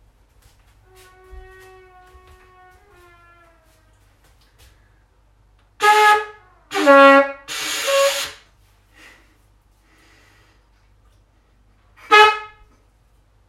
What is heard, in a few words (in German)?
Trompeten Battle